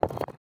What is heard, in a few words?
building barrier destroy